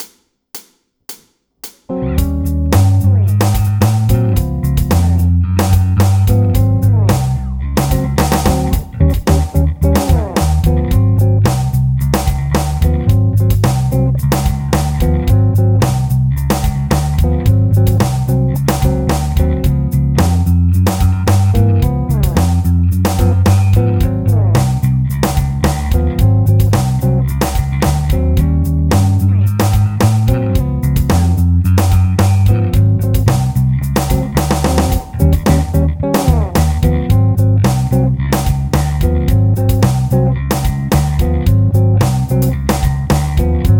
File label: Groove